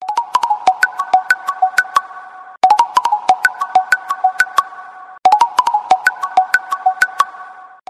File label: Sms Incoming